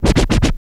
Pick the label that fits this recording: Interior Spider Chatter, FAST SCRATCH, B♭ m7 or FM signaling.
FAST SCRATCH